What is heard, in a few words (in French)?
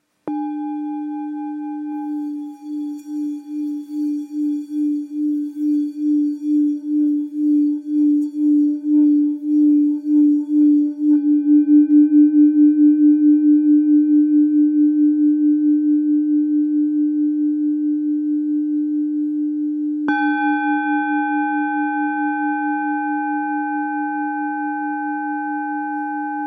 Bol ancien re